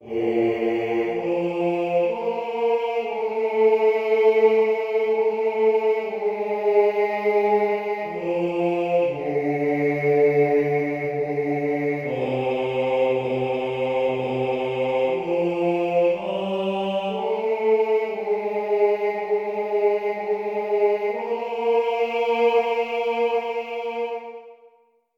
Bass, Barbershop Tags

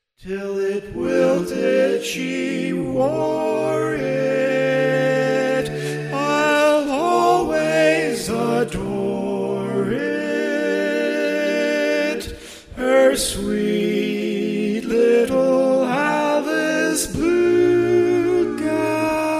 Lead, barbershop

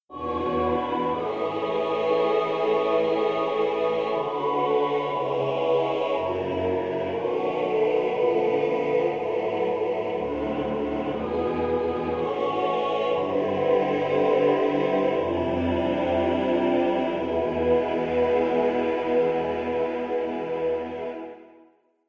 All Parts, barbershop

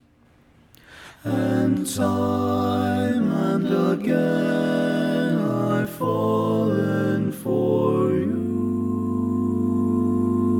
Lead, barbershop tags

